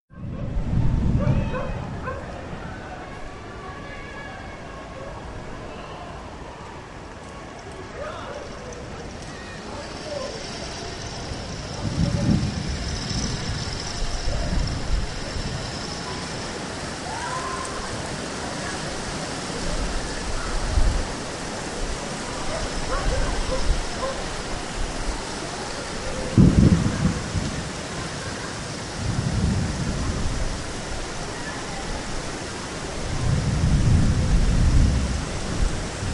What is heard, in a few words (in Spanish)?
el ambiente